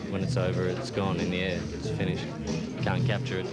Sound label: jazz